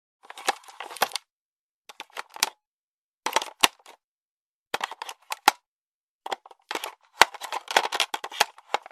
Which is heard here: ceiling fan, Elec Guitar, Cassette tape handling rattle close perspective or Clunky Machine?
Cassette tape handling rattle close perspective